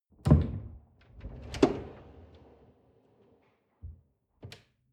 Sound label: folding door open long